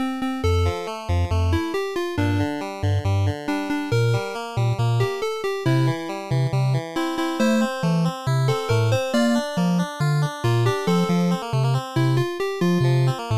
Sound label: Metronome, Title Screen; Nintendo DSi Metronome - Donkey Metronome (Title Screen)